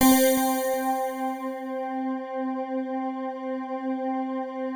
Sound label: PAD, DIGI-PADS